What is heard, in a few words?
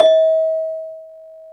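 BEL CELES, celesta; Roland - LA Composer vol. 1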